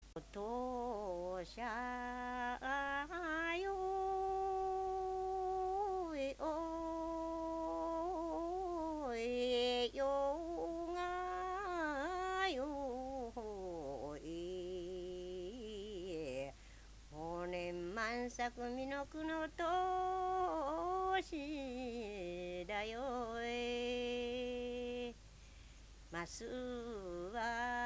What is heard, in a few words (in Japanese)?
taueuta, minyou